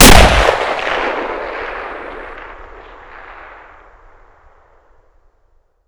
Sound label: Inf Weapons; Red Orchestra 41-45